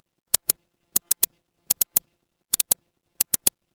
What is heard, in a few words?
office mouse click